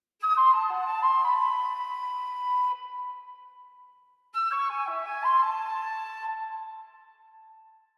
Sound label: Fanfare Flute